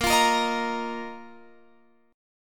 Bb Suspended 4th Bb down Guitar Open F; strummed

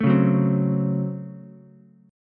Db Major 7th Suspended 4th Sharp 5th Db Ukulele; 2,1,2,3; DbM7sus4#5 strummed; JGuitar

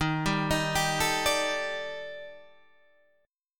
Eb Major 7th Flat 5th, Guitar, Standard; EbM7b5; arpeggiated; JGuitar

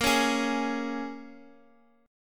Bb Minor 7th, Guitar, Open D; x,x,x,4,4,6

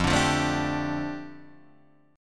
F 6th Add 9th F down Guitar Open D, chord

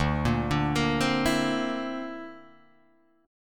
D 7th Suspended 2nd Sharp 5th D, Guitar DADGAD

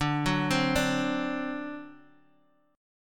D 7th, down, Guitar, Half Step Down

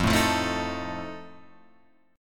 F 7th F down Guitar Open F; F7 strummed

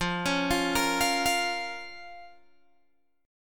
F Suspended 4th Sharp 5th F, Guitar Open G

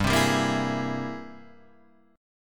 G sharp Augmented Major 9th G, guitar

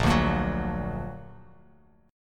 A sharp Augmented, Bass Standard; A#+ strummed; JGuitar

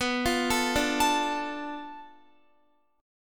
B Minor 7th Flat 5th, Guitar; Bm7b5 arpeggiated

